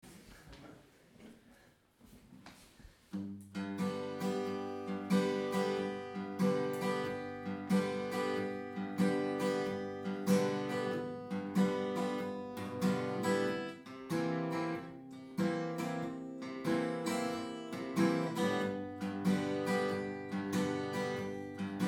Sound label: jam